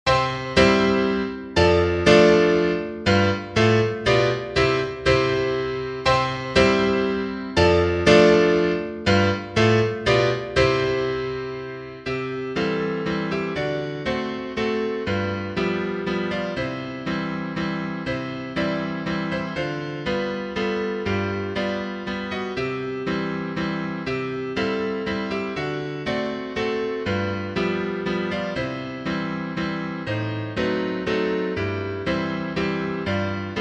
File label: piano